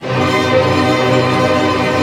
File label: ARP F# MIN PP, STR ARP; Sound On Sound; Peter Siedleczek's Orchestra